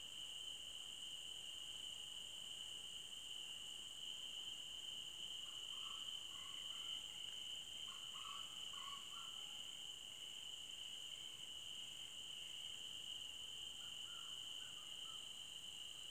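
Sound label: Ambience Base Evening; Botanist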